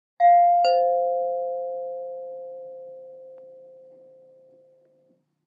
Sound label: Door bell chimes, ding dong